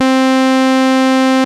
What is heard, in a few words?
Analogue hits and octaves